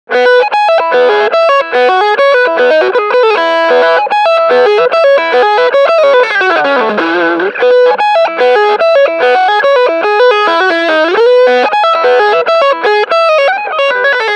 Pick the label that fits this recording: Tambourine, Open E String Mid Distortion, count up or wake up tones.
Open E String Mid Distortion